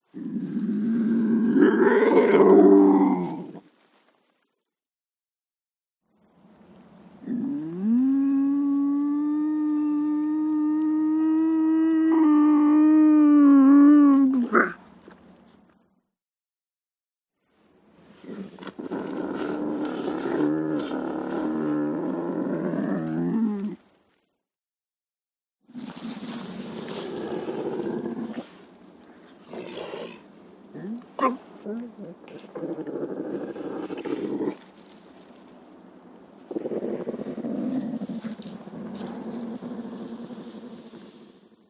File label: Lynx; audio hero